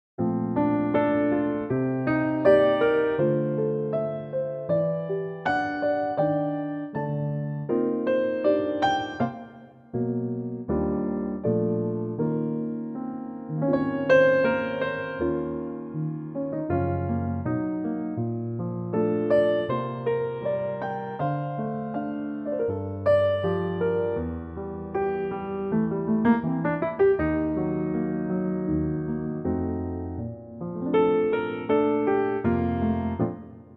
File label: Bb Piano